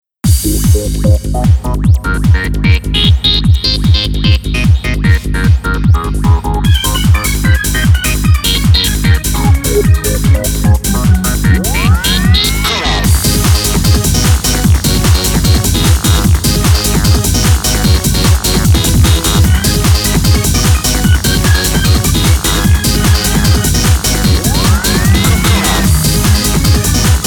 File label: Beat; DanceDanceRevolution Classroom Edition